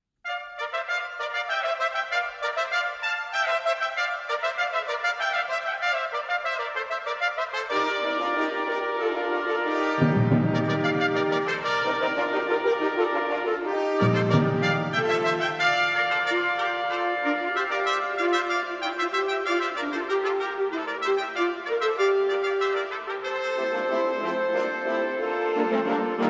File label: festival fanfare for christmas